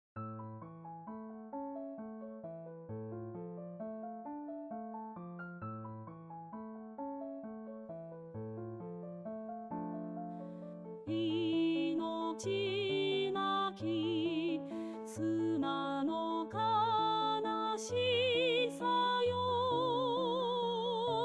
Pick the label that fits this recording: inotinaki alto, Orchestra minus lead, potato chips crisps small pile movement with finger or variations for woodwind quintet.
inotinaki alto